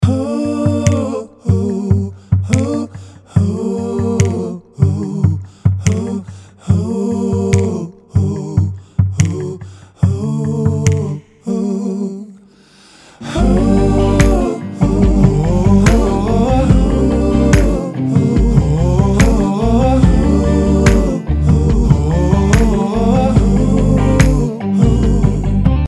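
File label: backing vocals with backing track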